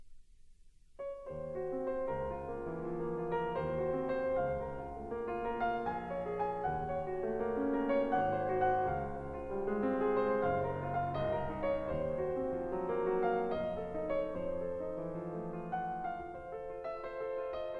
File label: Home page music